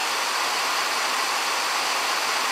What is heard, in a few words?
Saw Loop